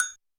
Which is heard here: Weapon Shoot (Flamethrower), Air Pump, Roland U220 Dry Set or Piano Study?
Roland U220 Dry Set